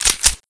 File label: usp slide back